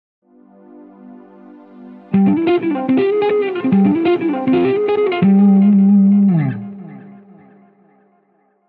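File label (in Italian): Esercizio 4, scala maggiore armonica di Do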